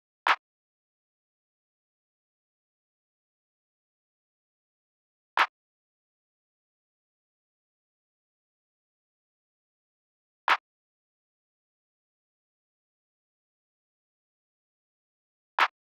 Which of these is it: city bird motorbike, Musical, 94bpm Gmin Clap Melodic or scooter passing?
94bpm Gmin Clap Melodic